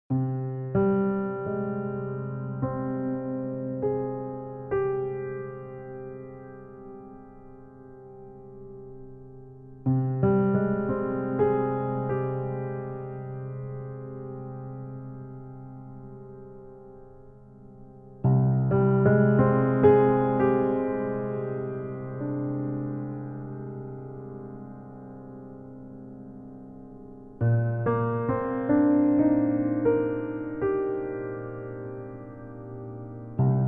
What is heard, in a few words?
Minimal Piano